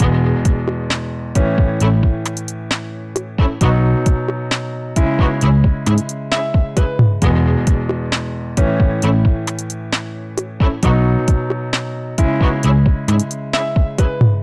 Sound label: overdrive grit; e analogsaturator500